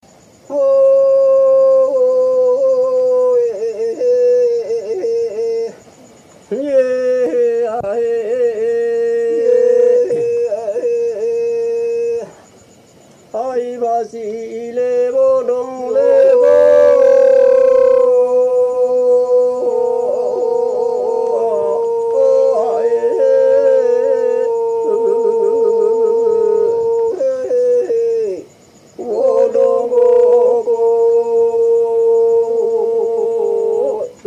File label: flores men, polyphony